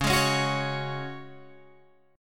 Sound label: C sharp Suspended 4th C sharp down Guitar Open G